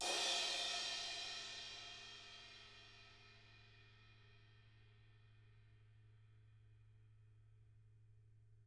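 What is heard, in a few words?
CRASH 18, CYMBAL; CYMBAL KITS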